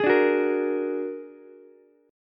Bb Augmented 7th Bb down Ukulele; Bb+7 strummed; JGuitar

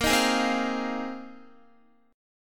A sharp 7th Suspended 2nd Suspended 4th, Guitar; strummed; chordshape; x,13,13,13,12,12

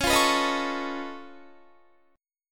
Db Augmented 9th Db down Guitar; strummed; 4E,4A,5D,5G,5C,6F

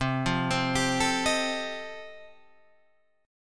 F 9th C, Guitar, Standard, F9/C Chord

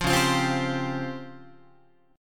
C sharp Major 7th Flat 5th Guitar; C#M7b5 strummed; JGuitar

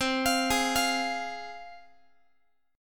C Suspended 4th Sharp 5th, C, down, Guitar; Csus4#5 arpeggiated; x,20,20,18,21,x

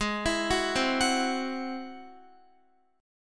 Ab Augmented 7th Ab down Guitar; arpeggiated; Ab+7; JGuitar

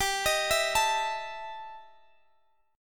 E Minor Major 7th Double Flat 5th, G

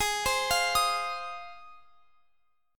Ab 6th Ab, Guitar, Open F; x,x,20,19,20,22; Ab6 arpeggiated

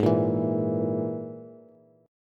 Ab Suspended 2nd Sharp 5th Ab, Bass; strummed; x,11,8,9; JGuitar

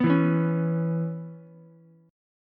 Db Minor 7th Db down Ukulele; strummed

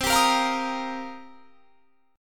C Minor 11th C down; Cm11 strummed

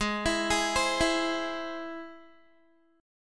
Ab Augmented Ab Guitar; 16,19,18,17,16,x; Ab+ arpeggiated

